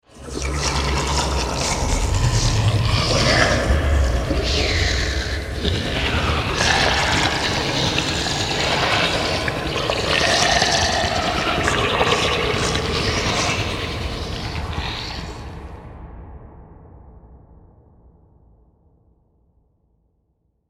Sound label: Disgusting Zombie Horde; Zomby Sound Effects; horror sound effect